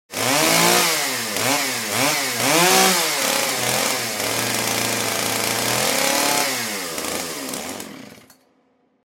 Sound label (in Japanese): 原付バイクのふかし音